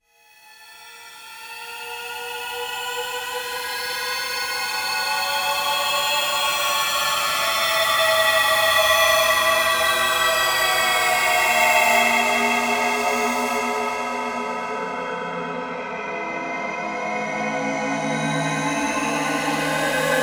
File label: Faery Cave